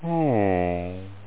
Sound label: BUST SOUND